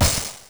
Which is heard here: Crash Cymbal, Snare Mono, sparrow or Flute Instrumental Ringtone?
Crash Cymbal